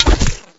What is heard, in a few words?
Arrow Impact Stone